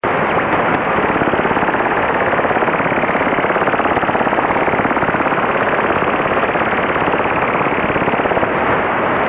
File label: 7910 ssb radar